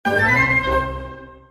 Allegro, notifications; Sony Xperia V

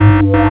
Ring, Cisco Unified IP Phone 7945G

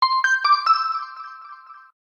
ray, notifications; Sony Xperia Ion

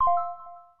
particle lock, phonetones, LG; LG Wine Smart F480K

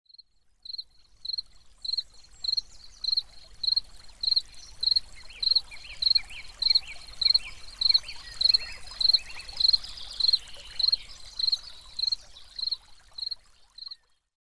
Cricket

